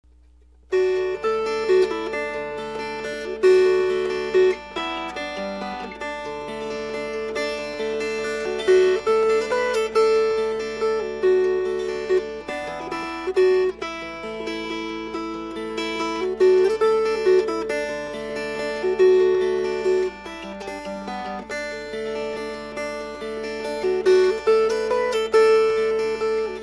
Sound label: Fingerpicked